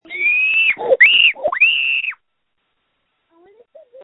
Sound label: scream